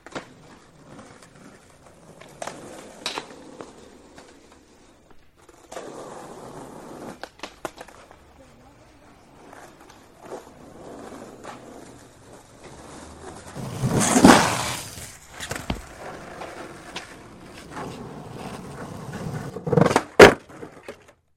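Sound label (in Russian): катание на скейте прыжок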